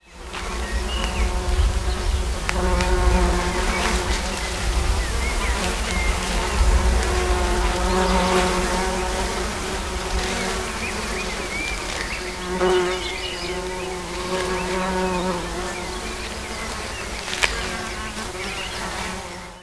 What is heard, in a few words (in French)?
abeilles